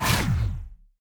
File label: enemy turtle Shaman basic Attack